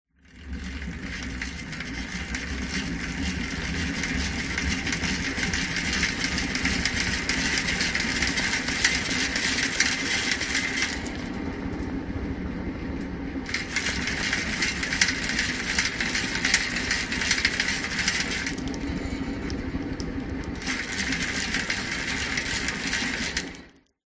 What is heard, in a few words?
bicycle pushbike wheel pedal fast stop start freewheel; vehicles